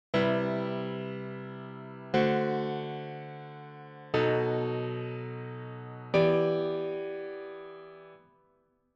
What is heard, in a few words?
strings